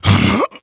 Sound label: vce effort large